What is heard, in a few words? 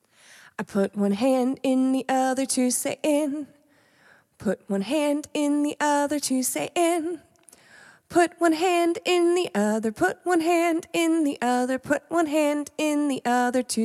signing song